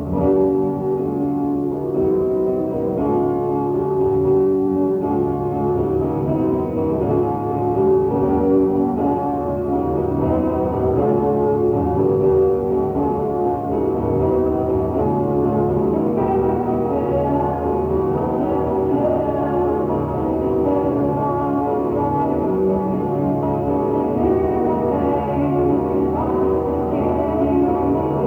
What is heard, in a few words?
soundcheck